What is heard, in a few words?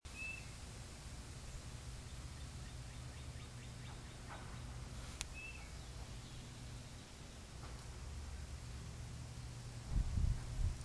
yard sounds